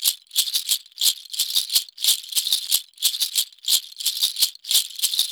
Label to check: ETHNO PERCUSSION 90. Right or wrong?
Right